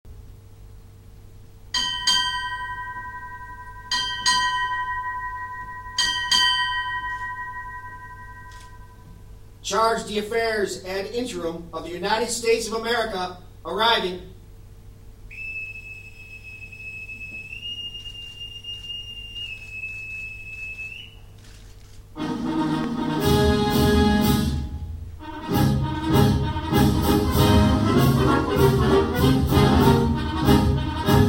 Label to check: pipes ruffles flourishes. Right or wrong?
Right